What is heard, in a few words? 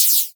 studio trap open high hat sample g key